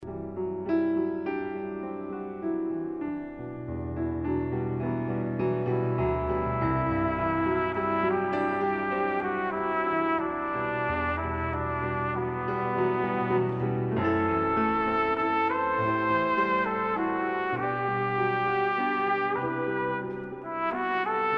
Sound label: silent, brass